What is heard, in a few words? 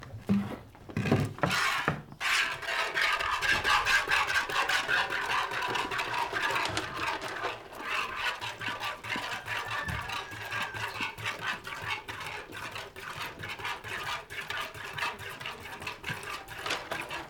Milking Goats